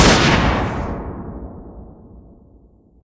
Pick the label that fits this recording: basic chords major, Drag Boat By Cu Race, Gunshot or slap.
Gunshot